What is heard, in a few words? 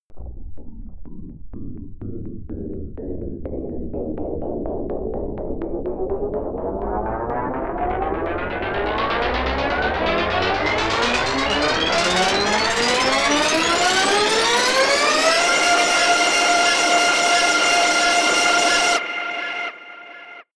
tape machine noise spin up, Risers; whooshes rises